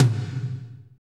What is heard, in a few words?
tom, Power Ballad; Northstar; Drumscapes Roland